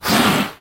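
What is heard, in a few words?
cat hissing sounds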